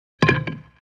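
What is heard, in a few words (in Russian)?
бейсбольную биту кидают на пол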